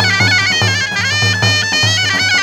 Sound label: N.AF.PARADE; Spectrasonics; Heart of Africa 2